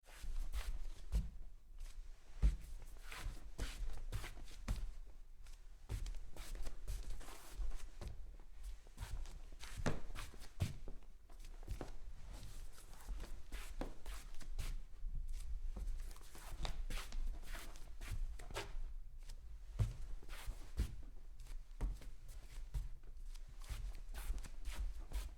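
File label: socks on wood scuff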